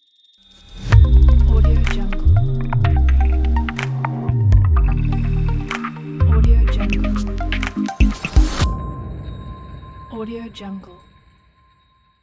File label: logo abstract beauty